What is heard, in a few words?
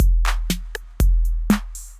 Kit 808, Drum Machines; Effected Drum Kits; Ableton; Factory Packs